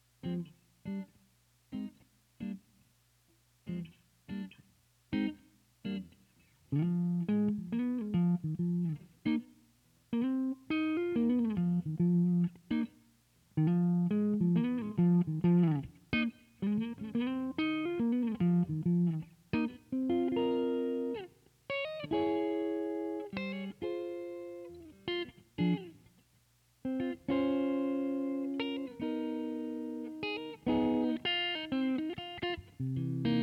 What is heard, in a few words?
Kemper reamp